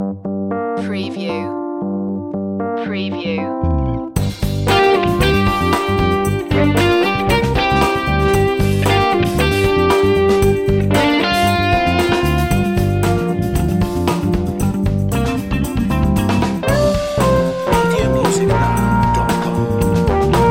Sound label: cocktail funk